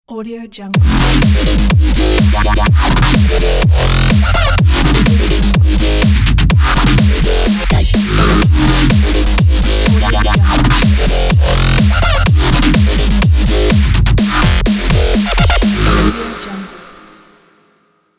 active sport logo

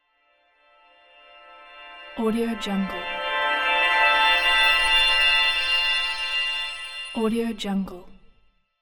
glissando chimes

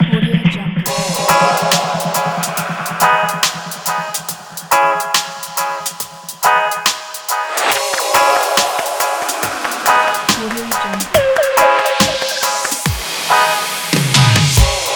reggae

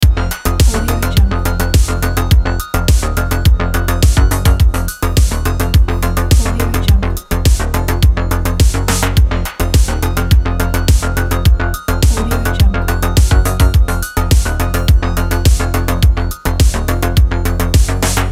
synthwave bass loop

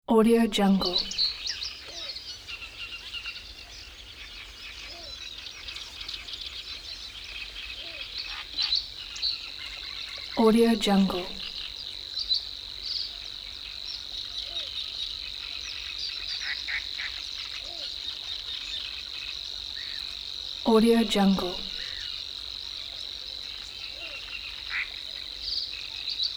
birds in forest

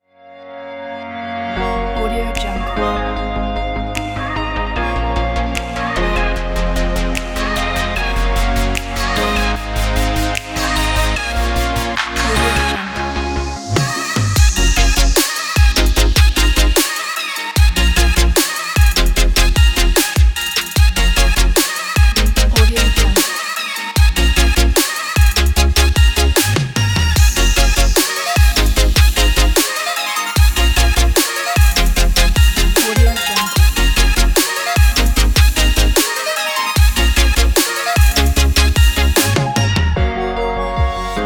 future bass

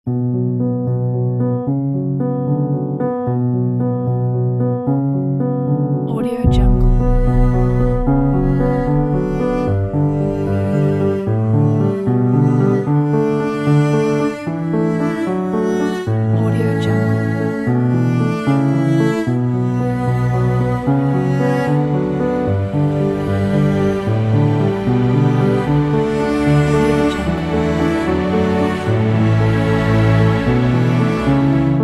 sad thoughtful inspiring piano and cello

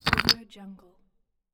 wooden block toys spill

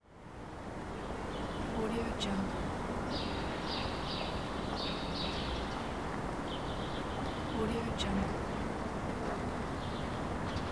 quiet city ambience

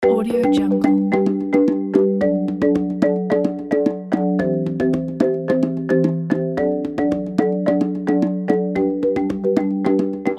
summer celebration dance

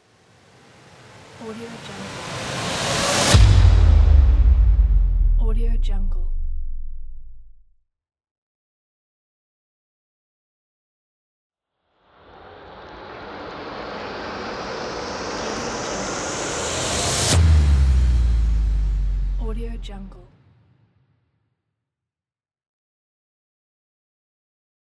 cinematic rise and downer pack; fly-productions

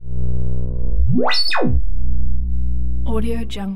hologram resonator swamp; soundmorph

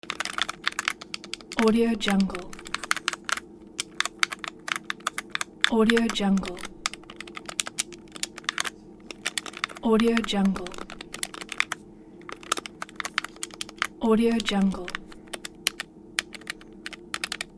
gamer playing with controller; happymusichappysounds

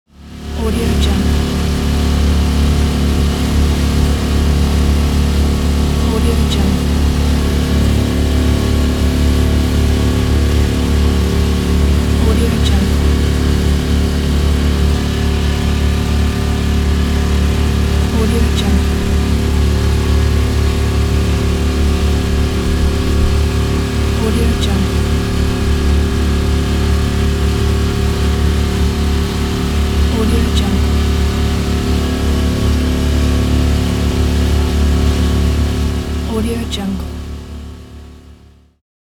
motor boat engine

